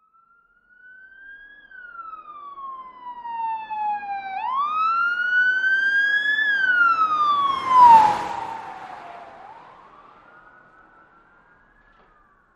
Police Siren: Fast Car By, with Various Types And Engine Noise.